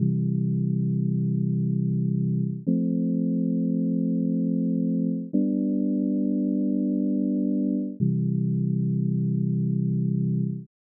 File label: chord cadence